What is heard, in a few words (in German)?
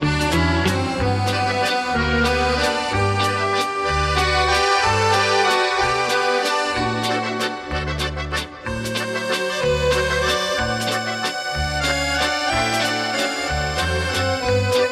akkordeon orchester